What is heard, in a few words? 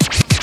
COLD SCRATCH; E-MU Formula 4000 Series Vol. 2